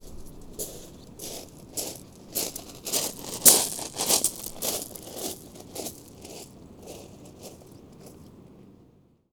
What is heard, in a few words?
Footfalls Gravel; footsteps